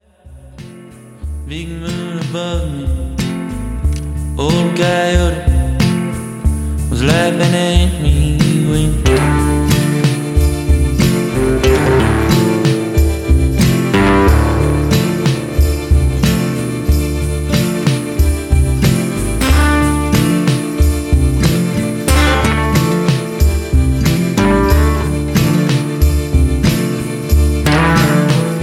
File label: singing saw